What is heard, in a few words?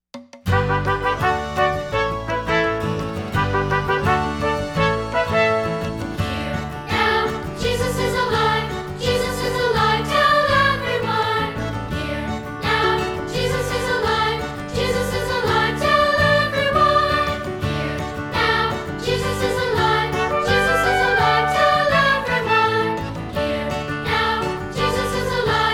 voices; Jesus Is Alive; Easter